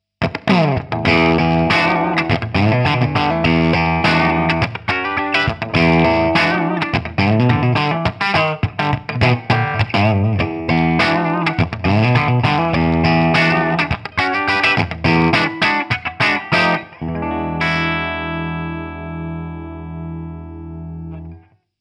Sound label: Gibson LP 50s Bridge Pickup Almost Clean